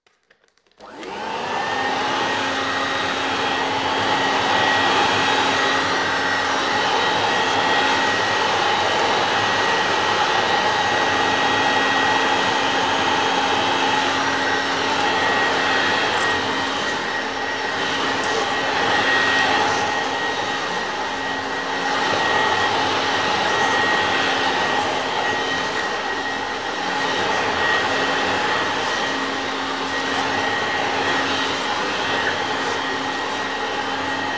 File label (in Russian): pilesos rabotaet uborka